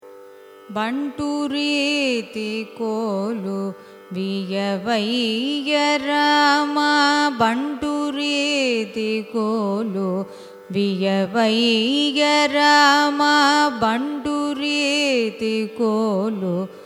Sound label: raga hamsanadam carnatic; bantureethi